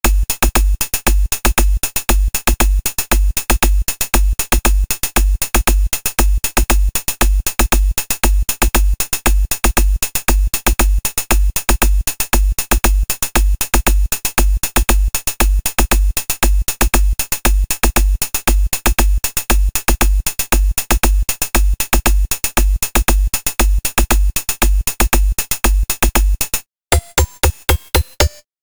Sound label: Pause Song; Spy Bros. (Pipi & Bibi DX); Switch, Windows gamerip 2023